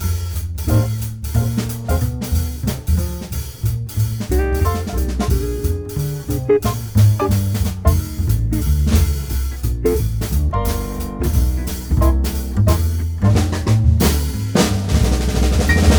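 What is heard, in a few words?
090 Trad Jazz Combo F major; Digidesign; Pro Tools Essential Loops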